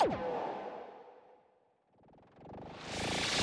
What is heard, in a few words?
Boss Laser Miss